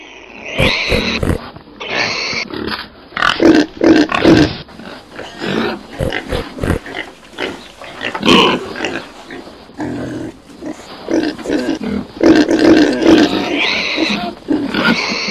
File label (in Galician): son porco; animais